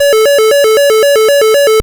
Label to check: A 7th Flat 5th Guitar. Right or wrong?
Wrong